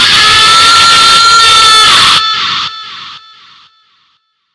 jumpscare sound; hidden fear 2

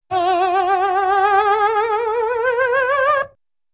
ascending twang

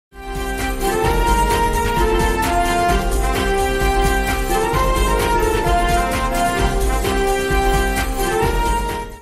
news flash

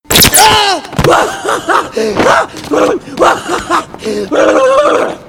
laugh; joker 2008

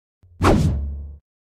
missing slash

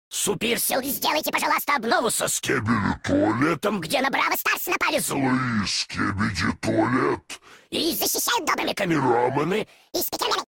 goofy

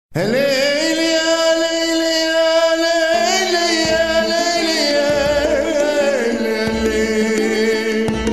ringtone

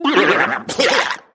blueah bleaph; smm wario

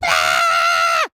goat screaming; cult of the lamb

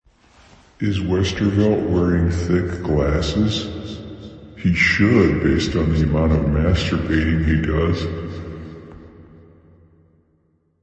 beats

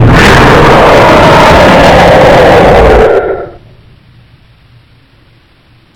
jumpscare sound; five nights at bradlys 1

